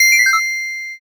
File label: ice magic